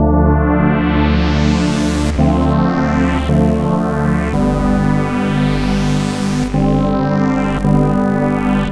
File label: Techno Pad; Dr. Fink's Funk Factory